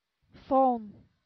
phone

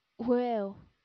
whale

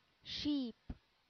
sheep

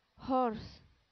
horse

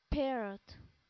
parrot

